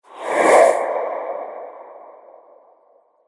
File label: Swoosh Whoosh